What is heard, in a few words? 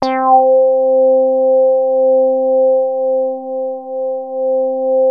C5, Korg MS-2000; Synthworx